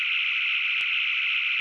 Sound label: klingon beam